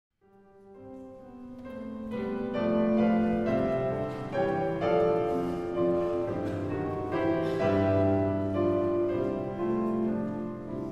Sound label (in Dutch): traditioneel kerstlied